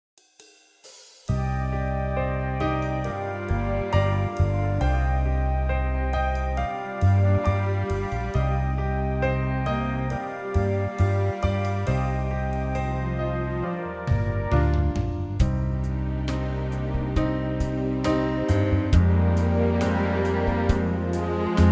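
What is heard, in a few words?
Cm, Backing Tracks for Male Vocals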